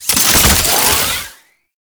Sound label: Soldier Zipline Start; Enemies; Gameplay; Warframe; Lasrian Soldiers